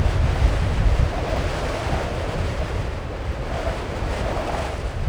wind heavy; ambience; Soldier Of Fortune II Double Helix; XBOX; kamchatka

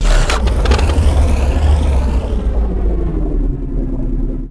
start, ships; Quantum Redshift; kumiko

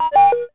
control Sphere boop

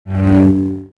saber hup

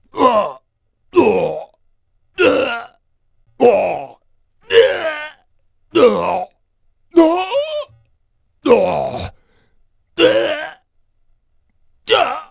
HARP

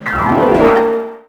Hit

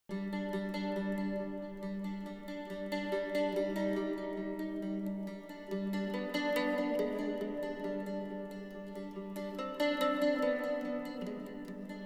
mandolin; Myst III Exile

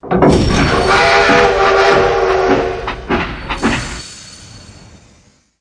start fx left; Pure Pinball

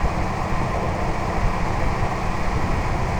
forklift; Matt Hoffman's Pro BMX 2; XBOX

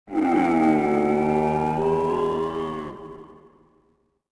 creature, ambience; falumpaset; yavin

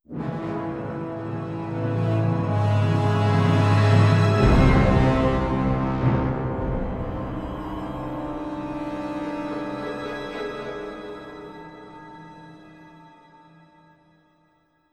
death; The Elder Scrolls III Morrowind GOTY; XBOX

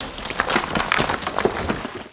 concrete wall falling; Max Payne; tenements

